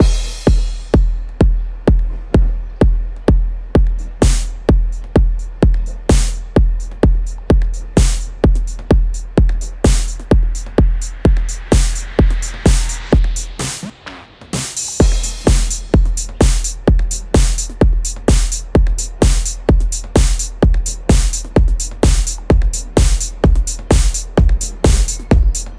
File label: 909 Train; Muzik 4 Machines